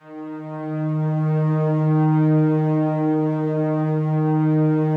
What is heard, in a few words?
slow strings D#2, Yamaha VP-1; 90 s Sample CDs; Music Store